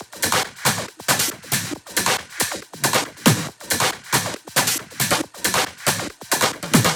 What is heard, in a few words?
138BPM Kit; VFH1 138BPM The Epic Kit